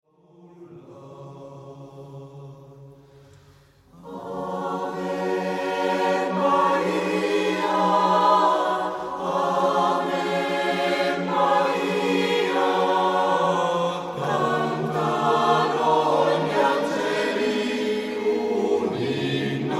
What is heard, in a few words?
Christmas Lullaby